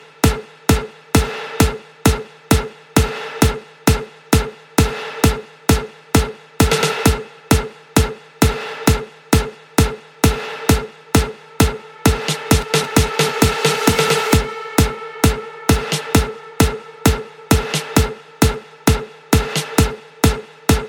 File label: bass beat ringtone